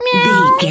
cat meow

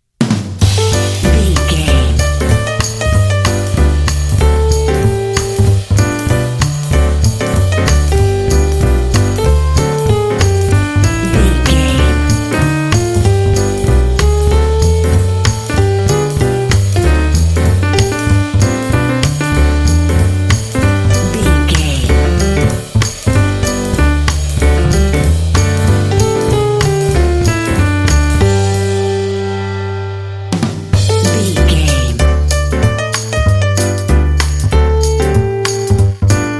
jazz tune

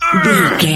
voice male hurt pain

